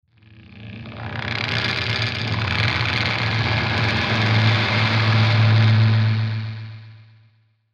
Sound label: Electrical Slow Crackle Swell Ascend Descend Delay; Production Element Imaging Element Accent Transition